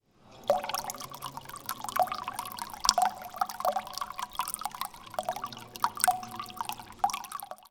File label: Gurgling Drinking Fountain